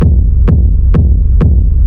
Techno Sub Kick Black; drum loops

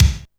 clean kickdrum sample c sharp key

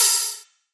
long tail reverb open hi hat d sharp key

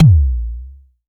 huge kick drum one shot a sharp key; kick drum samples

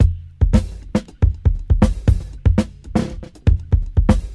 111 bpm fresh drum loop f key

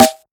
good snare single hit d sharp key; snare drum samples

